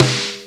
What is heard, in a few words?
Fairlight IIx CMI snare